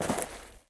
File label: dirt land; Golden Crayon Sounds